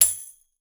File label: PLASTIC T, TAMBOURINES; Voice Crystal - Steve Reid Definitive Percussion